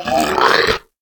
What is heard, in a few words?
Monster Mouth; David Dumais Audio; Monster Sound FX Pack 1